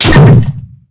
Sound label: tank hit small unpierce